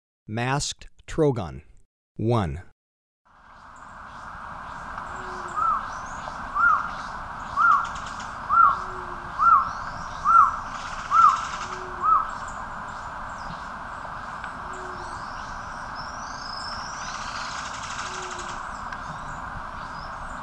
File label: Masked Trogon, Birds of Ecuador; S. America